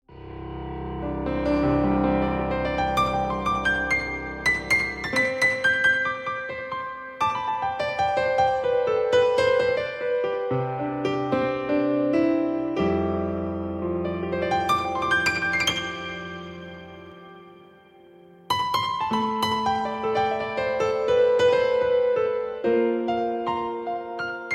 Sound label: christmas jazz classics; Weihnachtspraesente